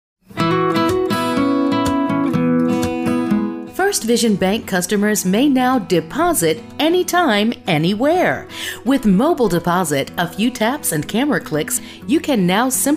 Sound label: Lobby Acoustic